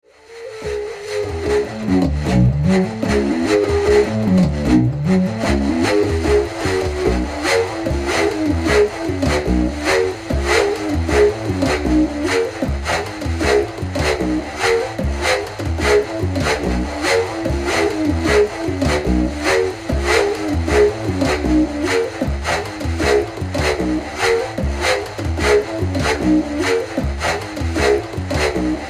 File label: WHEEL TRANCE, circular hocket